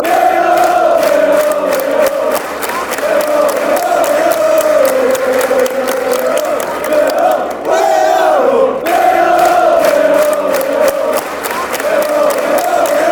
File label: we are hull, chants